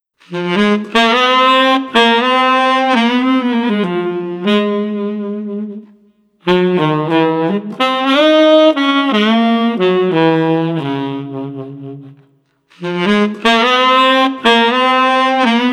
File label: Sax Thoughtful 120